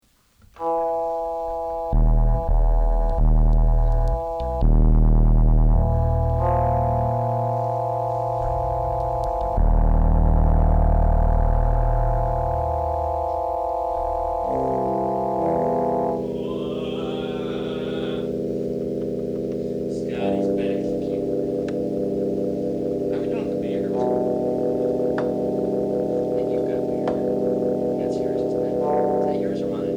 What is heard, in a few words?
The Piano Stylings